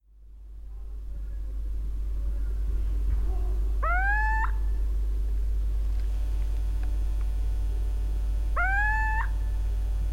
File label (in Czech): sýček výmena samec